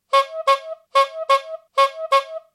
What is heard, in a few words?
Hooter; The wind family includes two special groups. Brass instruments (or horns) such as the trum